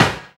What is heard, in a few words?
tom, dance drums